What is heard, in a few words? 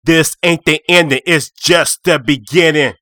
loops male rap